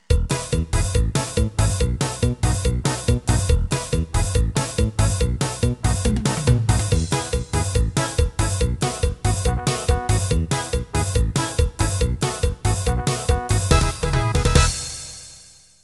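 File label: Quebradita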